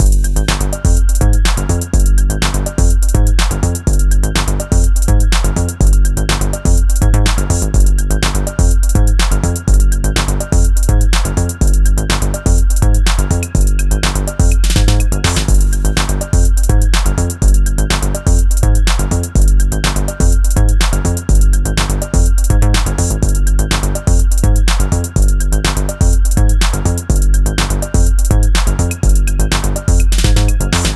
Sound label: Electro